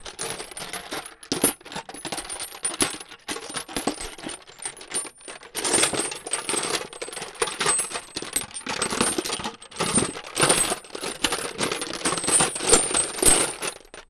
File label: sparkly rummaging foley; daily samples